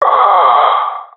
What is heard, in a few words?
death; Counter Strike; CS:GO; professional epic